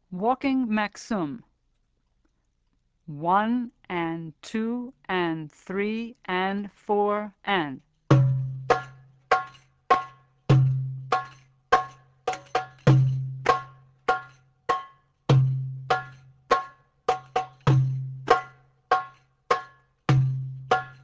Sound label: Walking Maqsoum